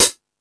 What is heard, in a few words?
High Hat, Drum Machines